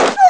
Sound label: ugh; ST-XX Amiga Soundtracker Sample Packs